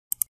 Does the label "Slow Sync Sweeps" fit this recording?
No